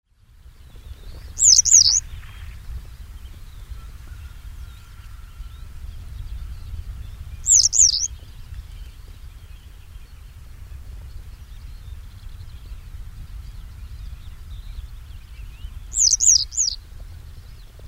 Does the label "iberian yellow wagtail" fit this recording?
Yes